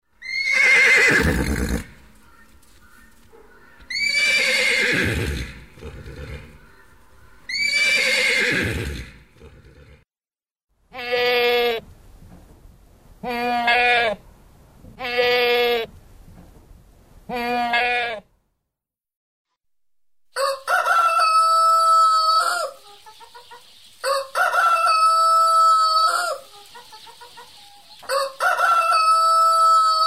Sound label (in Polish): kon owca kogut kot, glosy zwierz; druzyna marzen; 4-latek